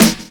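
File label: drum chop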